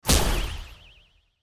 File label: meter reject